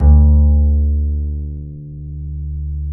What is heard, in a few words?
D 3, Bass; Spectrasonics; Bass Legends